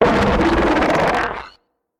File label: death, Hammerhead; Hammerhead gold